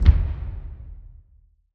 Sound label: Low Perc; Drums; One Shots; Ghosthack; Drum Mega Bundle Demo; Quantum Force 4